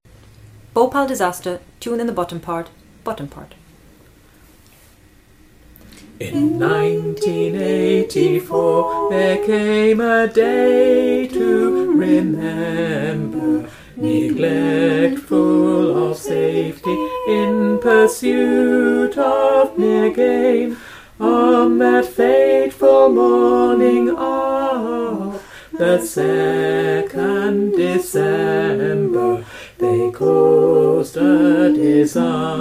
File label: bass